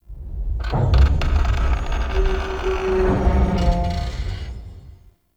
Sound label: Asteroid Big Ship Groans; Ambience; Warframe; Grineer